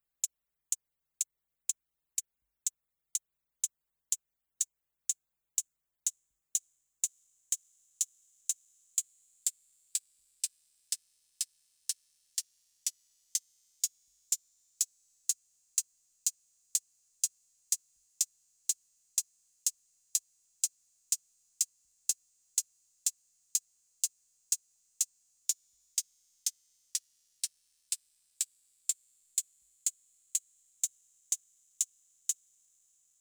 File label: MFB Tanzbaer Open Hat